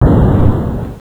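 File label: medium explosion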